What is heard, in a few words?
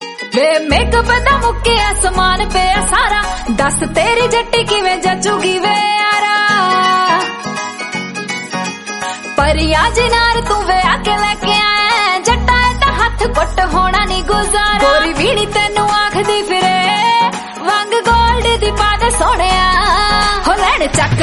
punjabi ringtone